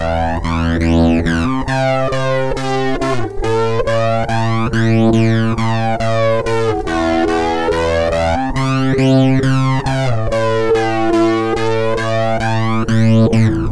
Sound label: fx dist flange